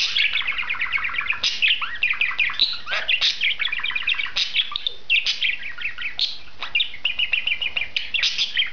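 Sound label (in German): perlender gesang, wellensittich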